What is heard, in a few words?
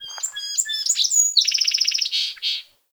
Forest Open Glade Bird Close, Day; Ambience